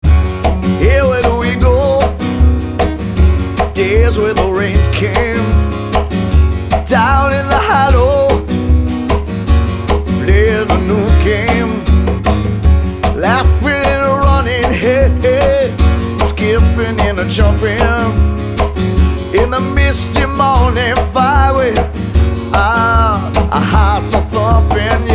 acoustic duo; Cover Band

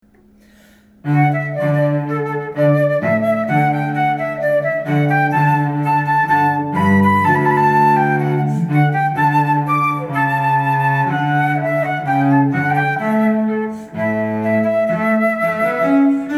Rondeau by Mouret flute and cello; Christen Stephens

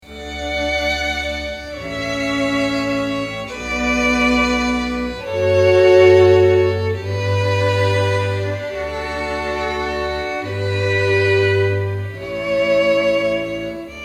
Quartet, Classical String Quartet; Pachelbel Canon

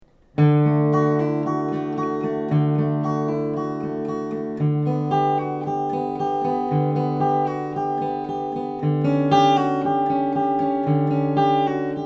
Prelude from Cello Suite 1, acoustic guitar; Bach

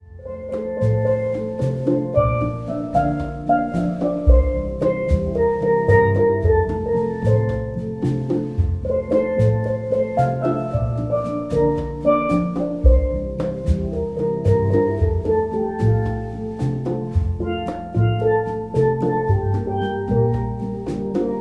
Quartet calypso, Steel Drum Band

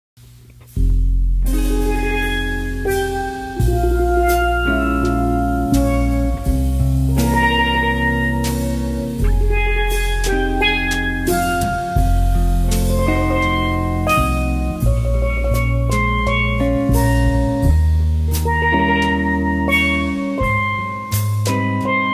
QUARTET, Jazz

